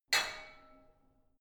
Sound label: barbell only lift up, fitnessstudio; sport freizeit